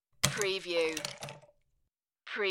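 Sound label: Ice Cube Throw Stir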